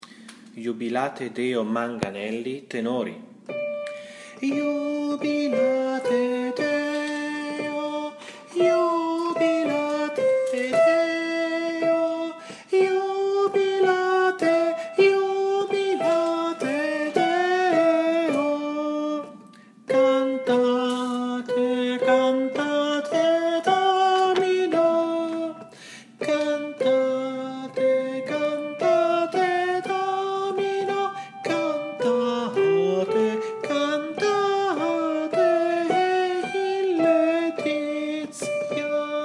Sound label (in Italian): Tenori; Iubilate Deo